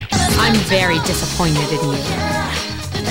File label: inaudible gasp; fuuka